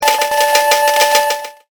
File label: Slot Cash Out